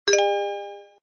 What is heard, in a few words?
level complete; flip it